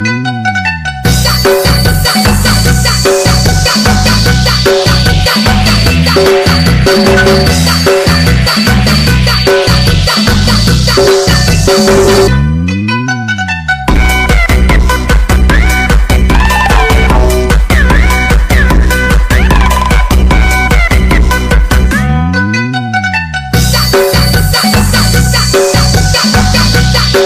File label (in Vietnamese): Nhạc chuông remix hay